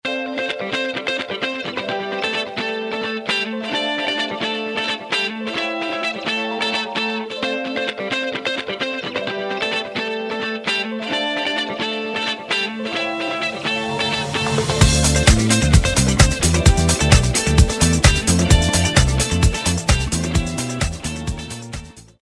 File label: Funky House, ringtones house latin; ghia productions